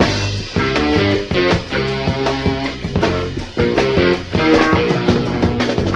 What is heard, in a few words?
guitar impertinent bastard; Loops; Phonky